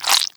squish

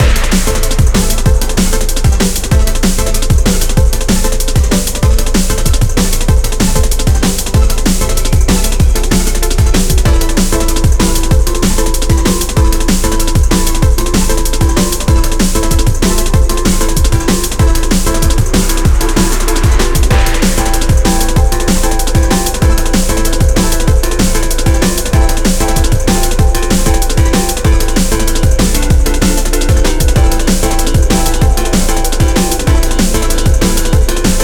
Drumbie; Hover Revolt of Gamers

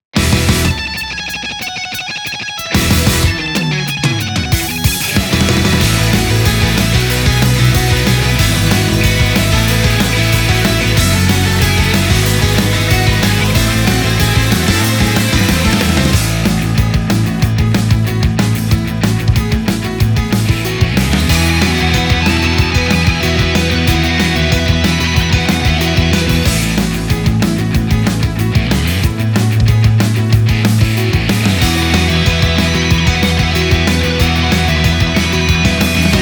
Instrumental version; Ocean Side

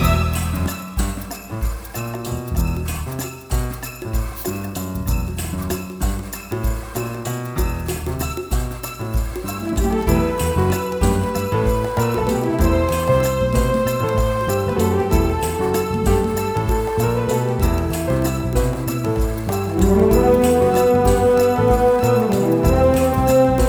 Loop

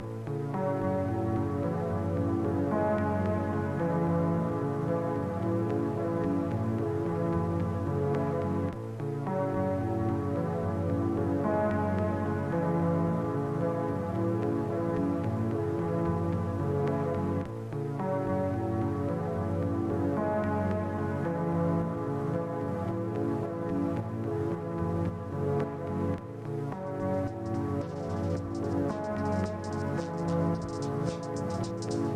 Ambient Generic

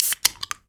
Can Opening

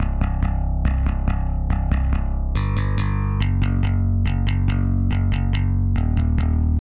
Drums and Jingles Explore Bass; Metal Tales Fury of the Guitar Gods

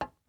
glass step

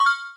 Speed camera; Dr. Driving 2; Android

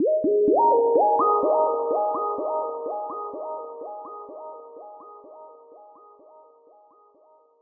Puzzle Complete; Hexcells

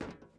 metal step

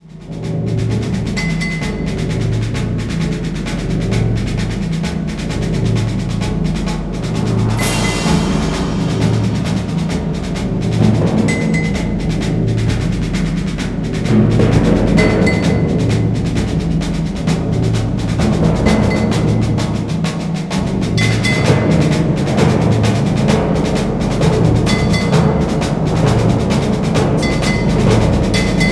Ambiance - Music; bet on soldier blackout saigon

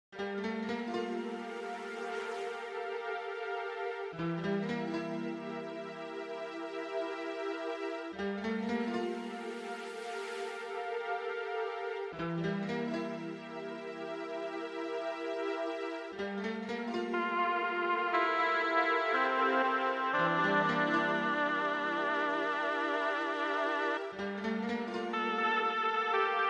MIDI Dungeon

